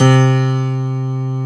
POP PIANO C3; POP PF

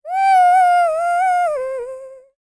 LICKS, Vocal Planet Jazz FX; Spectrasonics

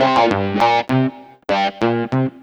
Metafunk, Leads And Riffs; Sonic Foundry (Sony Creative Software); Synchro Funk

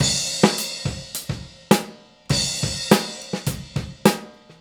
Straight Kit, World Pop Beats

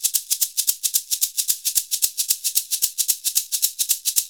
SHK, Shaker; Univers Sons; Basicussions

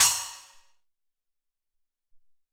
Splsh Cym, Alesis SR-18

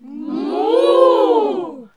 NOISE, CLASSIC VOX; Best Service; Hallelujah

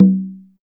TRBCGA OPLO, congas; Drums In Your FACE!

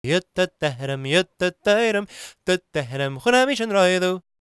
GAELIC, World Voices; ILIO; Vocal Planet

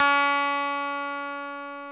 GTR STRAT, Clean E.Gtr, Brite Strat; Roland LCDP02 Guitar and Bass

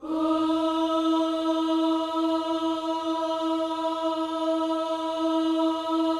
OOS E, Vocal Gospel

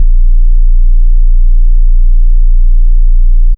kick, Big Beat Samples

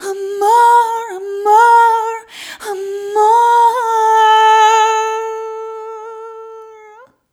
Rhythmic G

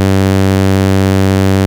2600 G3, ARP 2600; Hollywood Edge - Giorgio Moroder Rare Synthesizer Collection

